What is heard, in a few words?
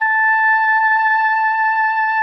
A5, OBOE; Xsample VOL-6